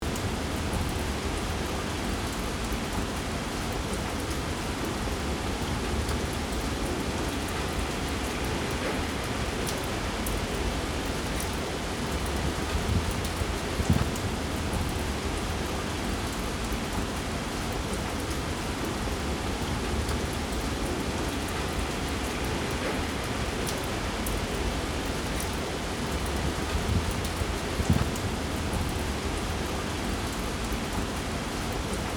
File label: rain; MoodClicker